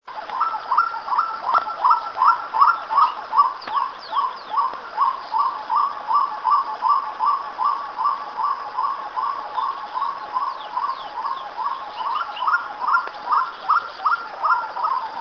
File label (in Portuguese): Odontophorus capueira Uru